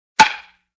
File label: Baseball Hit